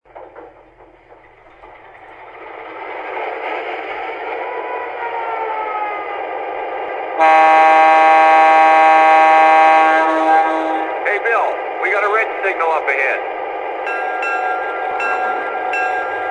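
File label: EMD F3 A, locomotive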